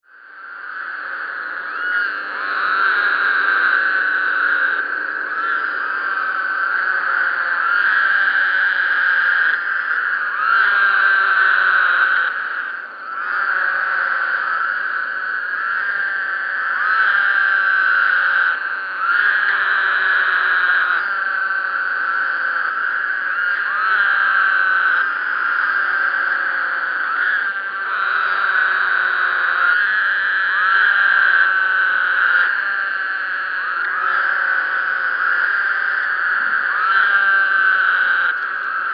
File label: b woodhousii chorus, frogs